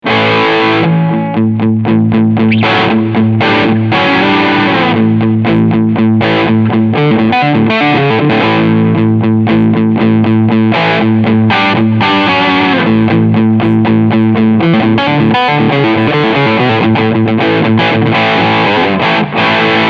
Low Gain EQ 12 Uhr

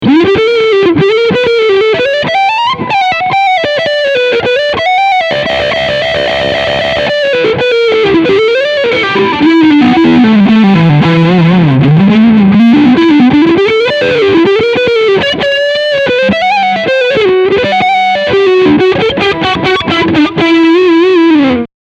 Hals Overdrive

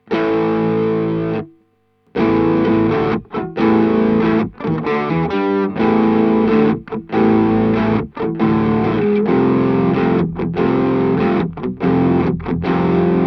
EHX Pico POG verzerrt mit Sub

